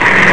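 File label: jeeps moving; Wargame Construction Set v1.0; SSI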